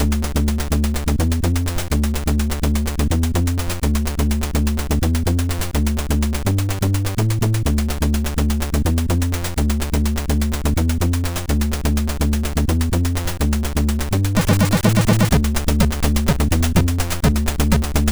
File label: Remix MOS6581R4; Budbrain